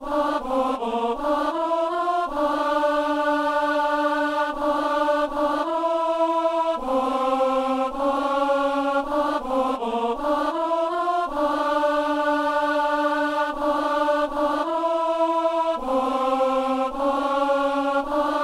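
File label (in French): ténor; messe simple; sanctus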